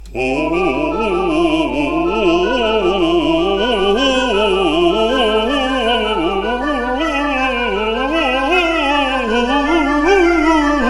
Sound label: Vocalise